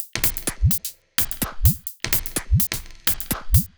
Toptail 127bpm, House Drumloops; 90 s Sample CDs; Zero-G House Fabrik Drumloops; ZG Drumloops

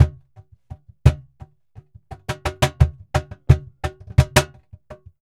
Flamenco, SOLEA PERC, 272

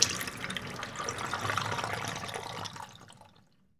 FAUCET, KITCHEN; AKAI S-Series CD-ROM Sound Library VOL-6

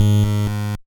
Buzz; Bitkits

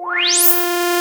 SWP ANALOG PAD; PADS; SYNTH FX

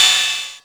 SYN PANG; Back In Time Records; Big Bang

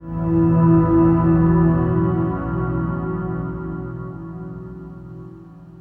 Field Up, Acoustic Drone, Long Loops; Sonic Foundry (Sony Creative Software) - Friendly Fire; DroneLoop

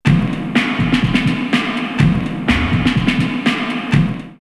Hardcore 124, drumloops Rave; X-Static Goldmine; 90 s Sample CDs

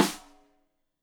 Rim Shot Stereo; Deeply Sampled Drums; SMDrum

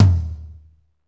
tom, lite jazz, Roland; Northstar; Drumscapes Roland; AC Lite Jazz; Toms

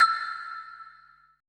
HIBLOCK, PERC; BPC PERC

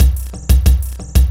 Loop Bit, Processed Percussion; One Shots; Sonic Foundry (Sony Creative Software); Rhythmicronics

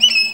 VIOLIN BIRDS; Best Service; World Colours

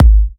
G#, kicks; markus hakala kicks

